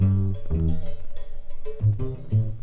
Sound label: bass; Phonky